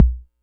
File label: digital quick, Kickbank; Ableton Foundation FreePacks